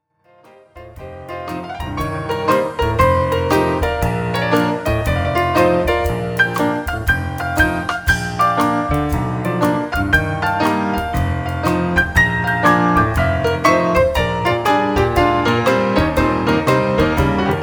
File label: Piano Solo; Boogie-Woogie